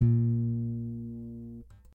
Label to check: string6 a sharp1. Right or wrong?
Right